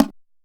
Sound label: HALAM KNOCKS; Best Service; World Colours